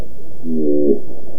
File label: 365 Hz Harmonic